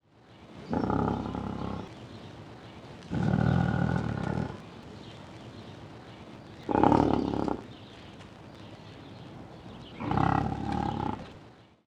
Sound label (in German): Wisent Bison bonasus Rufe; Gunter Tembrock